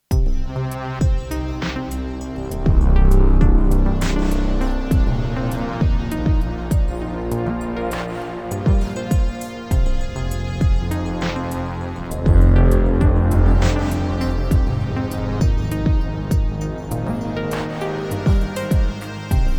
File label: LoFi 12XT Pattern